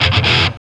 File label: GTR; Roland - LA Composer vol. 2